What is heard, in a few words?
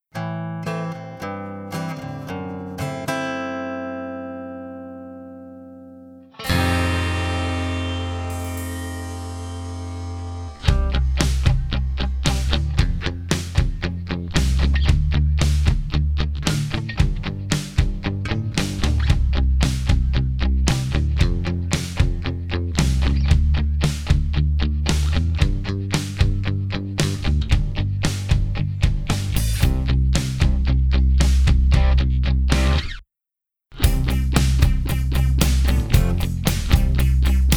Rainy Day Instrumental